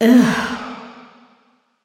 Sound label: ugh; smite; voicepack; medusa